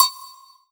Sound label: Roland SC-88 Agogo C6